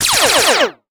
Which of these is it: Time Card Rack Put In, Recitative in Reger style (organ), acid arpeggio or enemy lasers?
enemy lasers